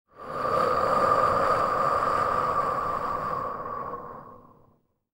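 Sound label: female breath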